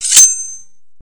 deploy, blades; weapons

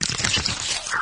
move, flood infection

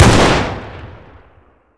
m3s90 fire

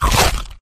infector bite; flood

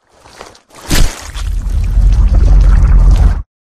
unarmed melee suicide; flood; carrier; predatorcz; halo

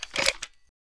ks23 insert; weapons

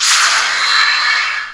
spell

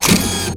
rockets ammo; serioussam2

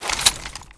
bolt forward; weapons

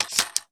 m4 stock, weapons

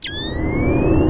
zapgun charge; weapons

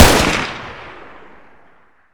sr25 fire

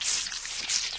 infector idle; flood

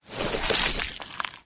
human form long move; flood

